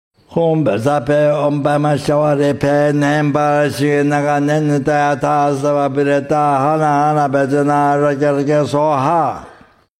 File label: Mantra; Vajra Armor Mantra